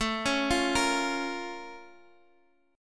Bb Minor Major 7th, A

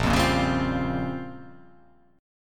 A sharp Minor 6th Add 9th A sharp down, chord

